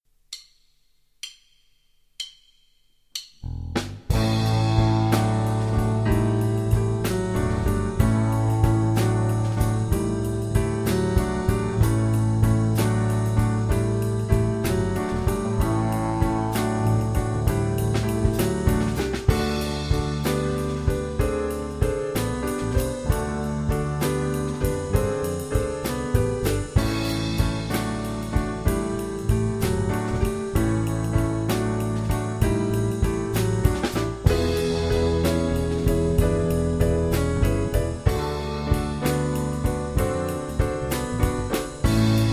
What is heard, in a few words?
Blues